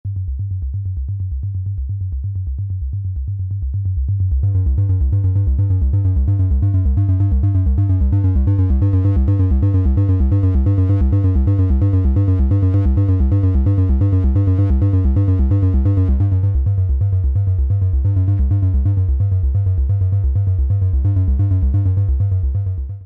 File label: ACL Filter Overdrive Sine; ACL System 2